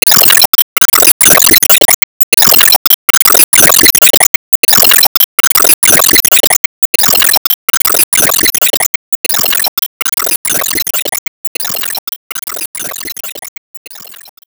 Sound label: Enemy Hourgrass Active Loop